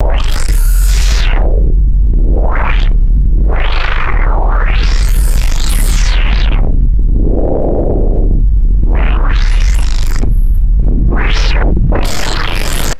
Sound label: low frequency synth filter sweeps; designed